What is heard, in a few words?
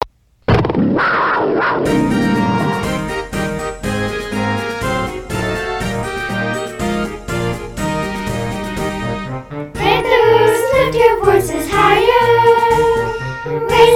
Parkside School Song Childrens Voices